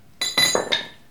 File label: Putting Hammer On Concrete